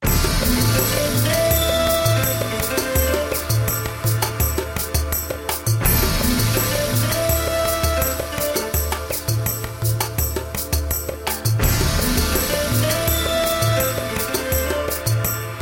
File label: LOOP; DANZA; APUNTE